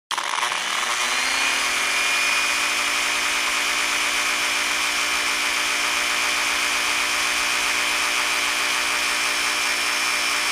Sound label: dremel med; dremel tools